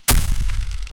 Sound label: ignition